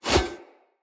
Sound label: Split Sword Whoosh; Weapons; Player; Swords; Starter